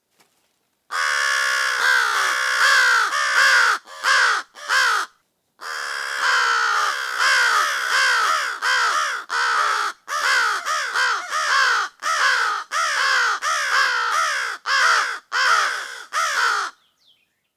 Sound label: AMCR mobbing human, bird; What the Robin Knows: Bird Language Audio Library